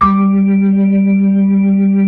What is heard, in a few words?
B-3, Blues